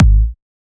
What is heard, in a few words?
Kick Hard Club